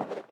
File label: step paw earth; Soundscape Overhaul